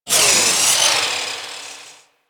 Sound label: Saw Launcher Fire Projectile; Warframe; Saw Blade Launcher